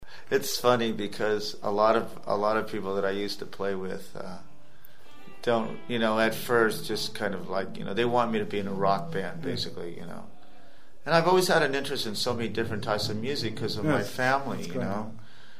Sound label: violins